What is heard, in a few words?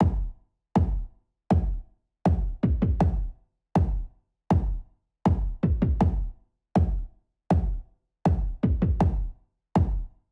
drums